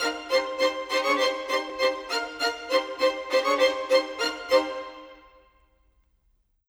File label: Violins, Orchestral; Sonic Foundry (Sony Creative Software); Orchestral VOL-2; Modern 06